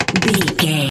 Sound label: lever turn push crank handle med